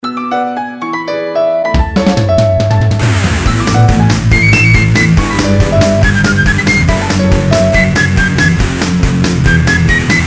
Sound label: Nokia Tune